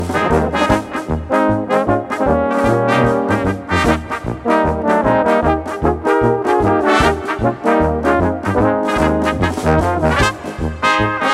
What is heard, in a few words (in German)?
Blechxpress Polka